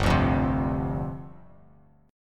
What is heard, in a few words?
G 7th Suspended 4th G down Bass Standard; G7sus4 strummed